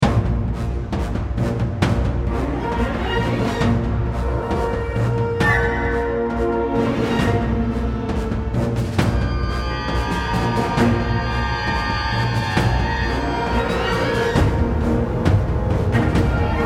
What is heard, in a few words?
Orchestra